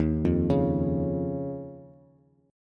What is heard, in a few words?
Eb 7th Suspended 2nd, Bass; Eb7sus2 arpeggiated; JGuitar